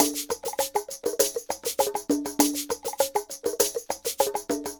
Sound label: Ensemble, Latin Percussion